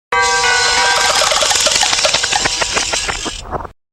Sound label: big clock sound effect